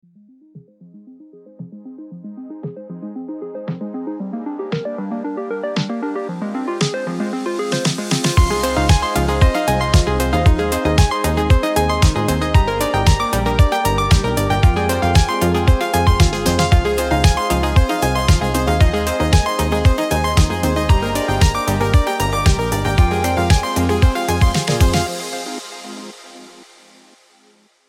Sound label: sparkling synth pop